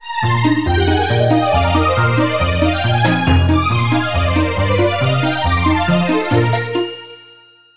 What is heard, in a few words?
jingle